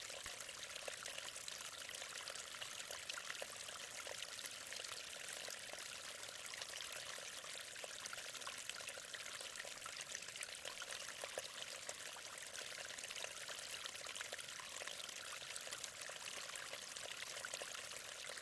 running stream

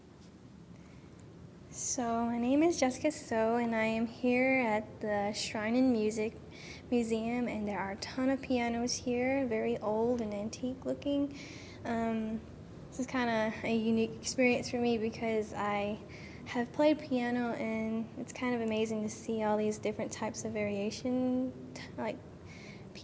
shrine and music

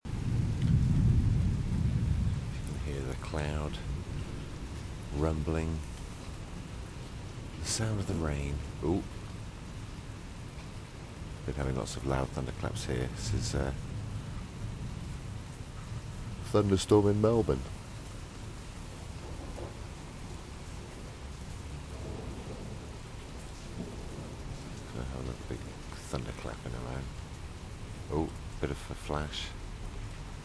thunder

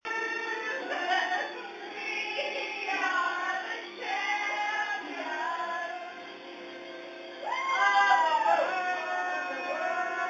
the kids singing

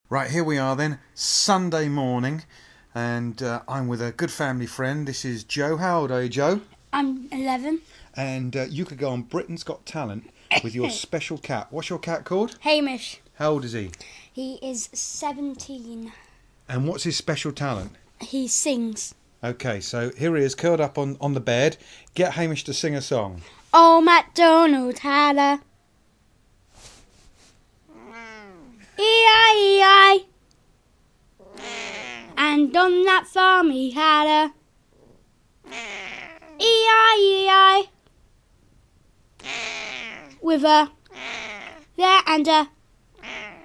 the singing cat; hamish; bootsstic

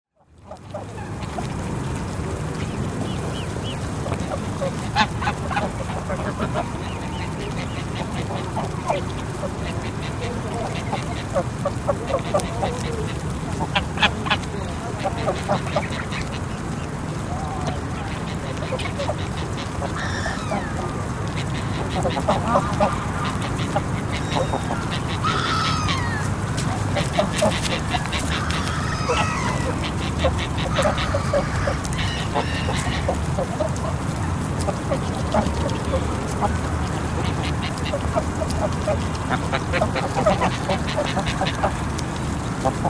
geese; caversham

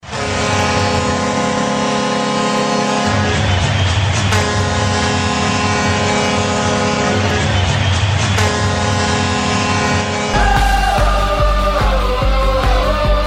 chill goal song

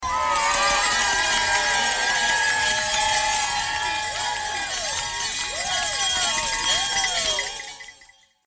georgetown bell ringing

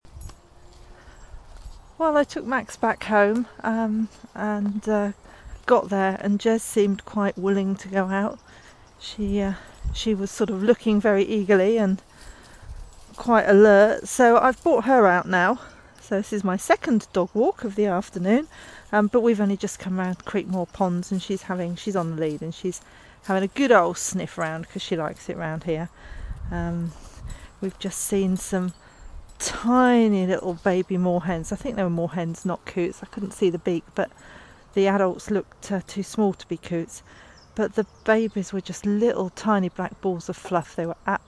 walking boo

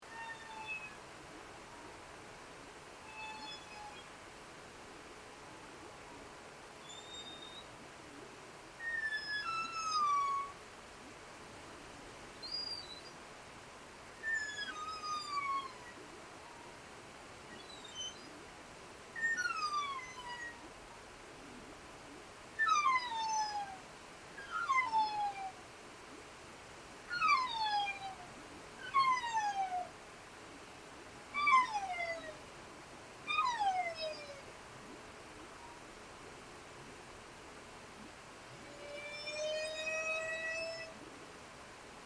humpback whales song off Westman Islands

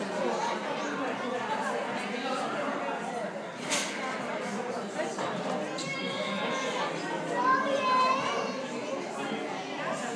cafe noises

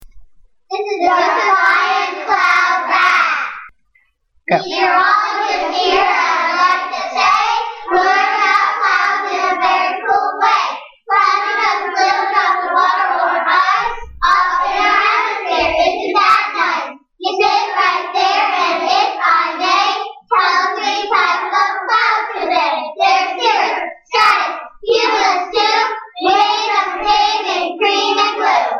rap

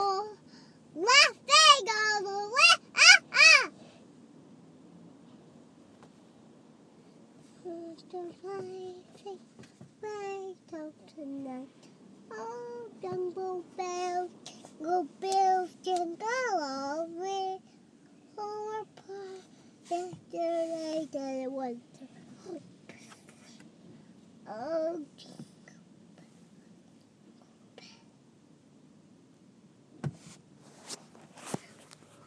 jingle

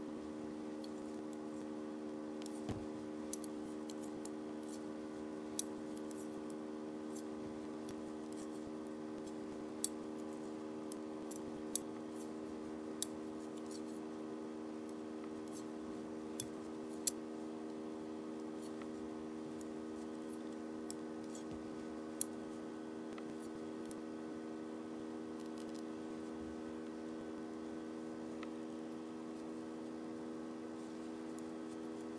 60 seconds of knitting

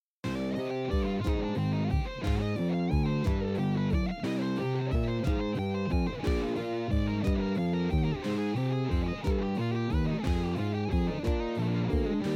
Bach prelude no 2 metal

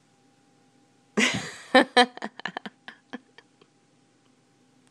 loser laugh

